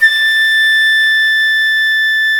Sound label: FLT FL AC, C Flutes; Roland LCDP04 Orchestral Winds; 90 s Sample CDs; C Flutes 3-8